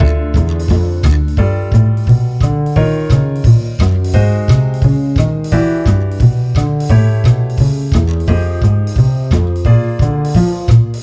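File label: chain minor 2nd up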